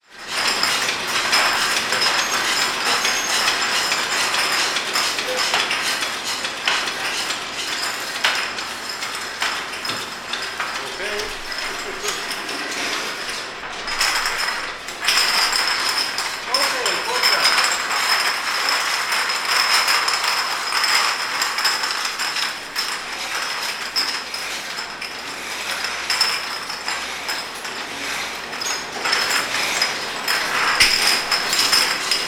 ambience factory pill bottles coming down conveyor belt; sound effects one